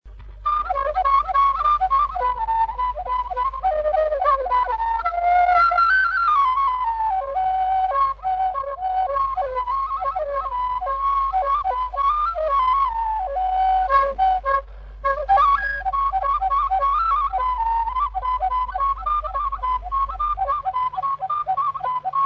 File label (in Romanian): Sarba II la fluier; jocuri si cantece batranesti; Vaslui